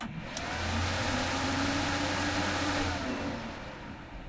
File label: shelter computer on; Original TK Sounds